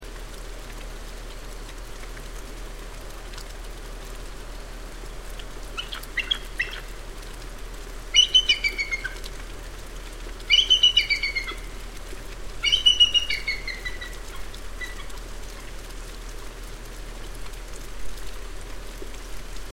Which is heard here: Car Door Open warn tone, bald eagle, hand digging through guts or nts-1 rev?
bald eagle